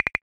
message tone; tones

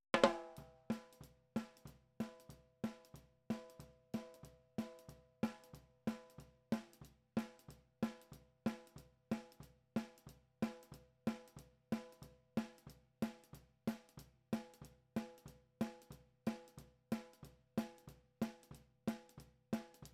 Snare Drums

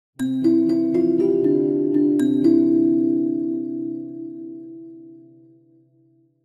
ringtone

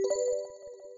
cashout

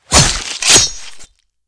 knife stab; garrysmod

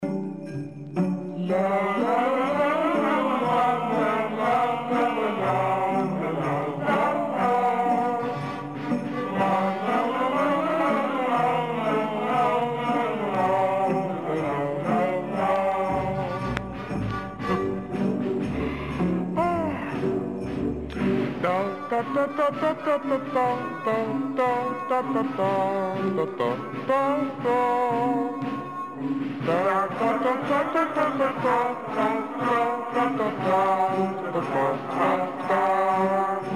lalala

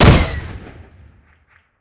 rocketlauncher fire; npc; gesource; weapons

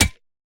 pickaxe strike soft; weapons; rust

